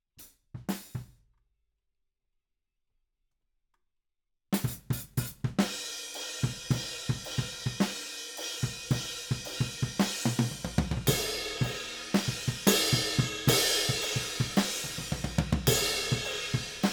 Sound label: Ride